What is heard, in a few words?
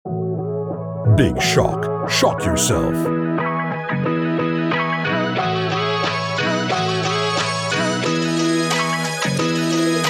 ringtone